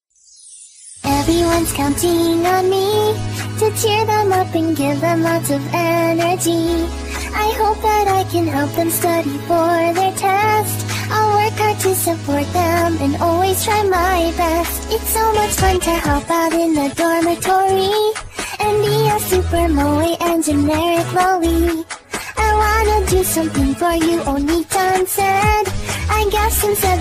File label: funny anime song